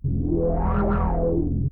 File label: scan loop; Apotheorasis